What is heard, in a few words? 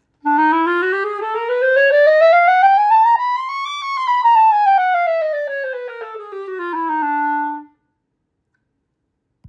Clarinet chromatic scale